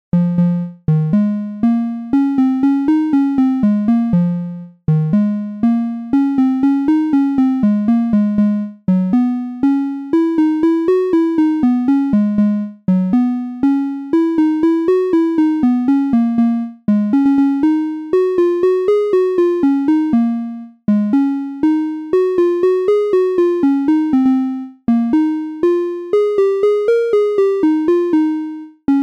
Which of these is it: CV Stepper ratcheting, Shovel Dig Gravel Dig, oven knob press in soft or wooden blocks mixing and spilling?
CV Stepper ratcheting